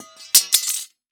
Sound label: Weapon Sword Drop On Concrete; AdobeCC